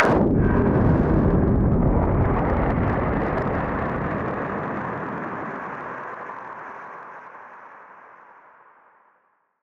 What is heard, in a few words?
Noise Bomb Harmon; Noise Bombs; musicradar; bomb factory